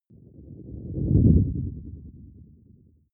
whoosh storm wobble short; swooshes-swishes

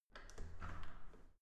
entrance door open normal

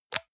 hygiene small cup open hard; haus-garten; badezimmer

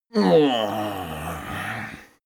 male moan stretch; soundpool

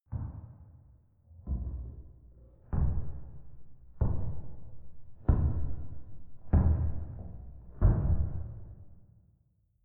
step church wooden stairs slow; menschen; schritte

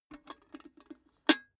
cash box metal put down handle; maschinen; soundpool

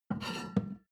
plate on plate take soft, restaurant cafe

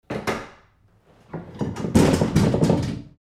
door sliding close

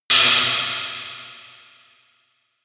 high metal click

